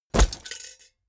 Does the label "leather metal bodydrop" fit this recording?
Yes